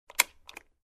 Door lock click sound

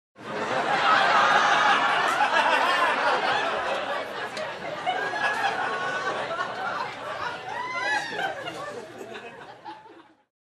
Crowd Hilariously Laughing

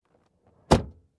Closing Car Door

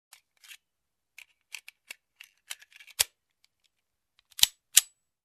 Reloading glock

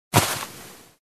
Box of clothes slam

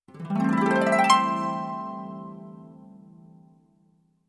Harp glissando up close

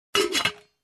Closing sauce pan

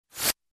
Short Noise Sweep

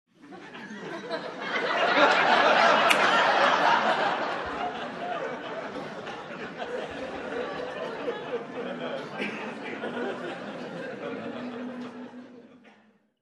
Crowd in a room laughing

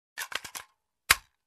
Toy gun reload

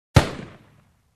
Gun shot

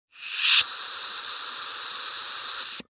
Tire Pressure Hiss

